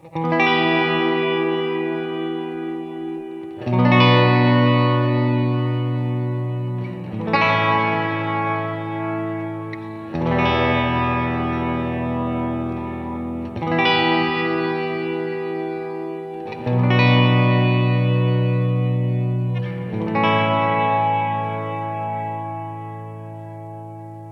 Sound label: Modulate; Boss RV-200